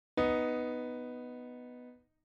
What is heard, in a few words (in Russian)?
garmonicheskij interval